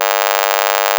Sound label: saw hi pass